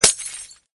glass; eaglercraft 1.5

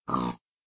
say, pig; minecraft; mob

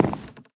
wood, step; minecraft

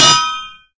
anvil land; minecraft

